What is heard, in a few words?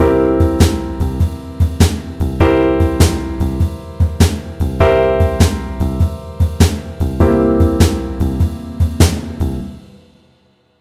Blues comping